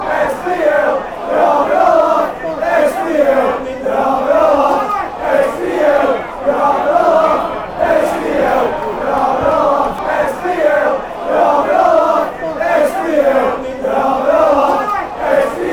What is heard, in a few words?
they're having laugh, chants; spl